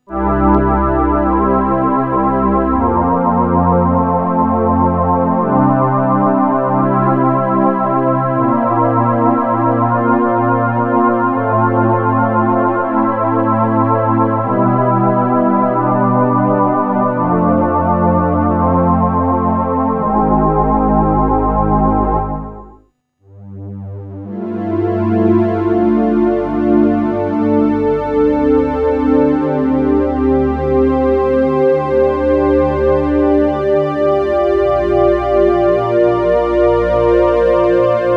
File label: jp-8 pads; Jupiter-8; arturia origin; sans aucun effet